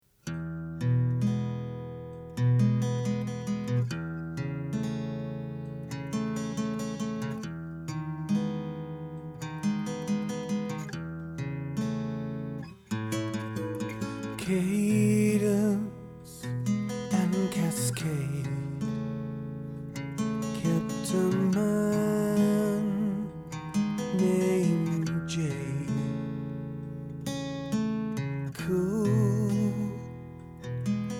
vocal

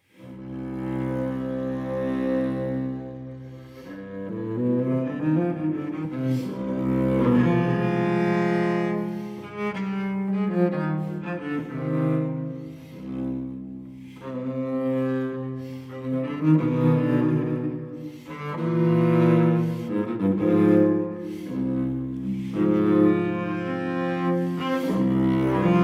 Cello Suite No. 5 in C minor, Prelude; BWV1011; Johann Sebastian Bach

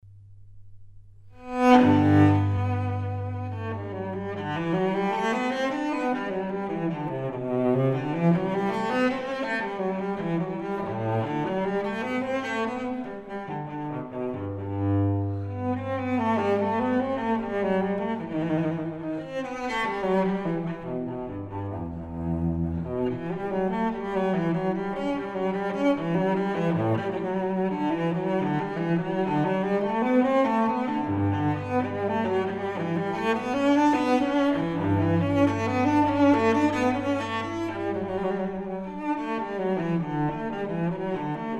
Suite for solo cello No. 1 in G

